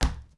Char Fs Sprint Wood; We Happy Few

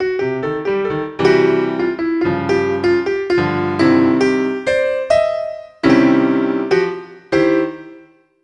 Parl Music

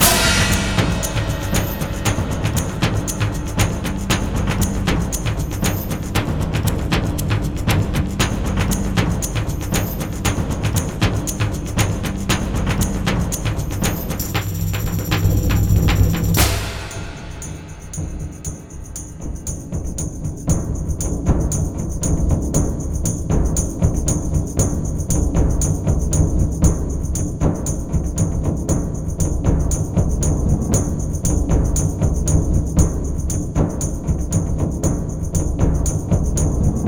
Drum loop; Stronghold Definitive Edition

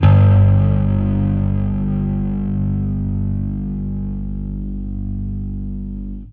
Fuzz G3

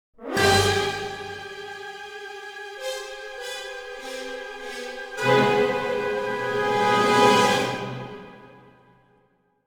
jingle danger; Jack Keane 2 The Fire Within; Windows gamerip 2012

